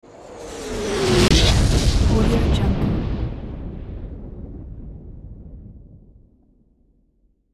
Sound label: explosion incoming and impact